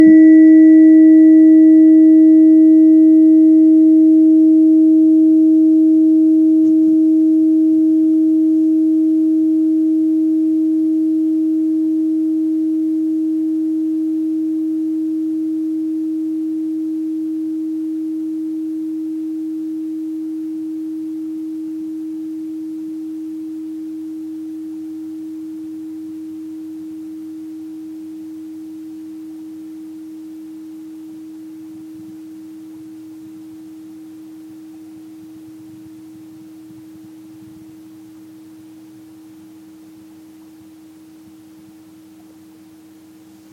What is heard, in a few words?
small intestine, tuning forks, meridian